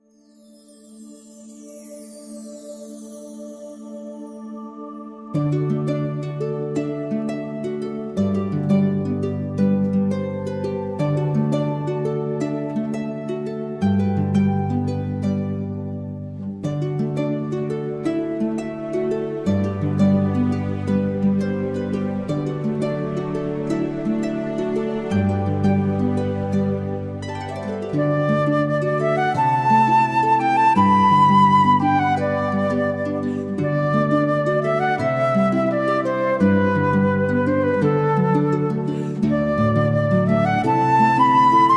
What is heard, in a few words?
Theme (Mystic Forest)